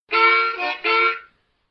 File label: harmonica; Quiz